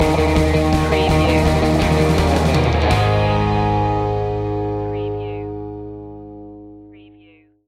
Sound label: surf stinger